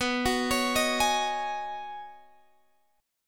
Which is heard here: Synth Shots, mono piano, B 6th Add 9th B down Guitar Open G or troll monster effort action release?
B 6th Add 9th B down Guitar Open G